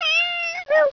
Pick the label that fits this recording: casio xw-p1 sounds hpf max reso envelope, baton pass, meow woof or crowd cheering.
meow woof